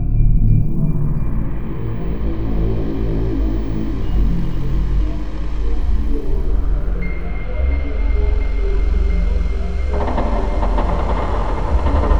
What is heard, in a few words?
Ship Inside Ambience